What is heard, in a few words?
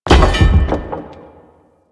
Debris Metal Wood; ambience; Warframe; Entrati